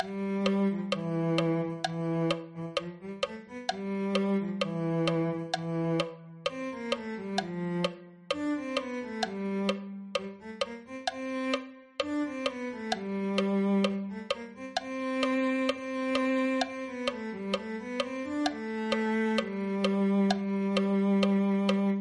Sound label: cello